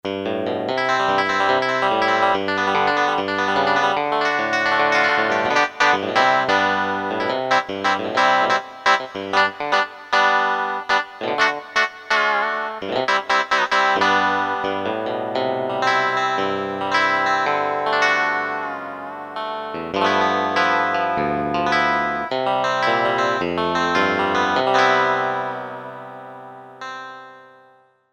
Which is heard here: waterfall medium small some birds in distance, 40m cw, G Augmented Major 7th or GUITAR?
GUITAR